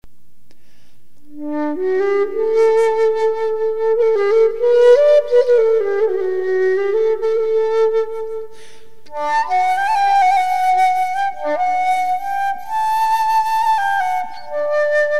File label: Egyptian flute